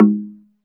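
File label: SLIT DRUM G#3 F; SLIT DRM1; Heart of Africa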